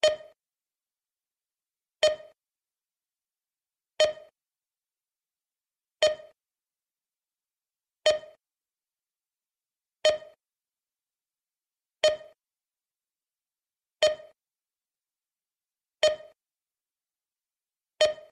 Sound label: timer beep